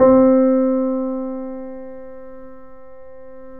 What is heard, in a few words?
The Piano, C; InVision Interactive - The Piano; BOESINGSOFT